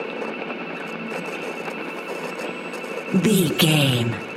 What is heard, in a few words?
phone hacking static loop